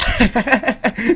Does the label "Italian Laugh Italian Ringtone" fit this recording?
Yes